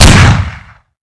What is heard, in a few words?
rocket fire; svencoop; paranoia; weapons